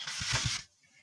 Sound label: clean